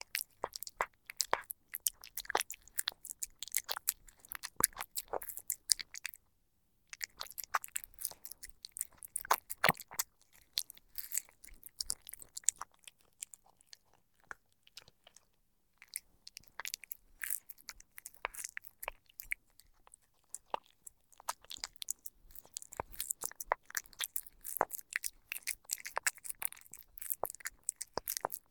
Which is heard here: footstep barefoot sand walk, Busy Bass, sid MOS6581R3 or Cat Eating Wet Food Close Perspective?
Cat Eating Wet Food Close Perspective